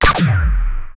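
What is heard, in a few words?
sci-fi pack a punch gunshot; shooter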